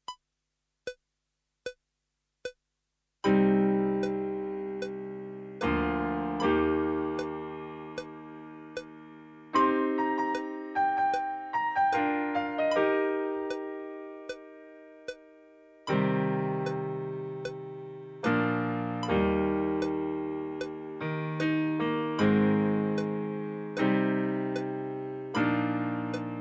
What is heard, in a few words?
PIANO CLICK